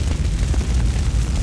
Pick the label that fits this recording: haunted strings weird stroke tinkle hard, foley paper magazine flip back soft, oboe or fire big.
fire big